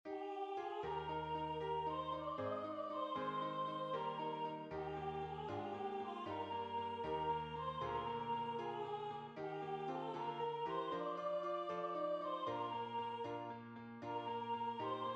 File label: Suleika And Hatem tenor; Midyear Concert 2026; Waiheke Island Choral Society